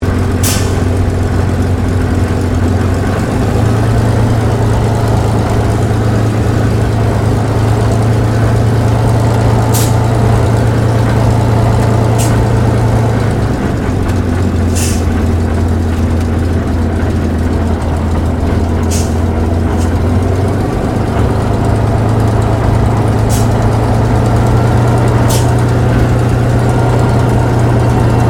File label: AIRCRAFT SUPERMARINE SPITFIRE MK-XVI TAXI INTERIOR STEREO; Airplane Sound Effects